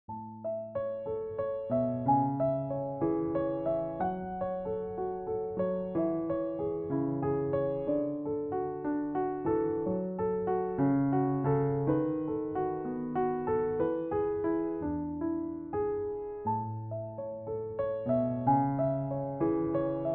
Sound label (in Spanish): Arpegios amplios